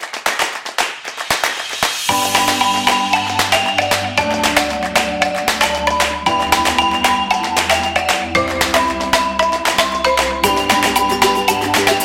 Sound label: Funny; cooking show